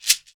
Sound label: New Shekere